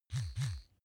razor smooth double

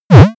high digital jump

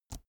keyboard laptop space release soft

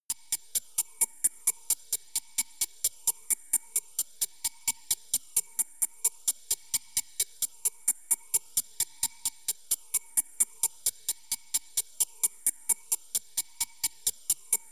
bomb ticking phaser

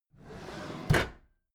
packed drawer smooth clatter open regular

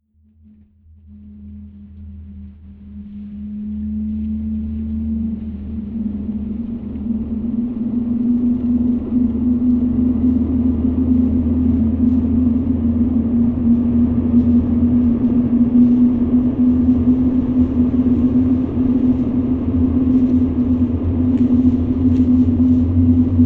wind swept

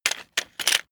plastic sundries handling

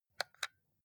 console controller xbox xyab press soft

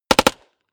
smg uzi 45 close

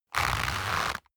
leather creak short medium

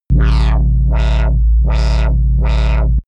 alien engine room

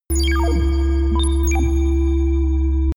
facility compute

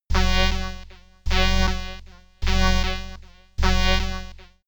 warning alarm

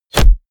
bow hit generic

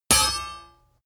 sword hit sweetener

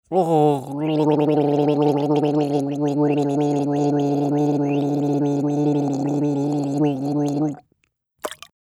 gargling with spitting

